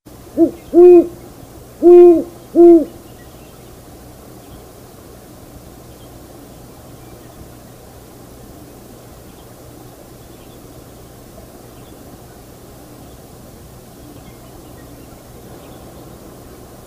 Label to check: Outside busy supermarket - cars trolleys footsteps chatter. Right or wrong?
Wrong